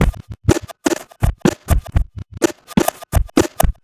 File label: perc 125 broken bitz, Percussive Loops 125 bpm; Sample Magic - Transmission-X